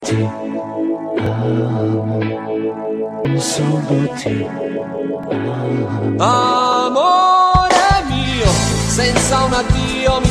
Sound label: italian love mix tone ringtone